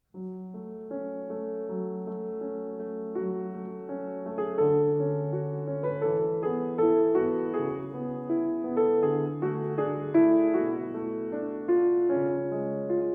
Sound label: Pavane, Arr. for Piano; Op. 50